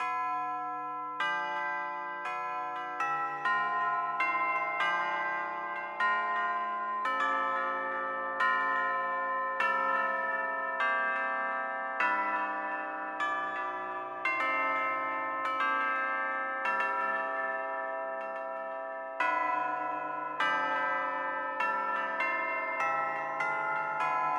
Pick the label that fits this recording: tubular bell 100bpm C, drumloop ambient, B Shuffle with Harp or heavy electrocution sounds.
tubular bell 100bpm C